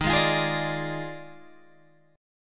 Eb Major 7th Suspended 2nd Suspended 4th Eb Guitar Open G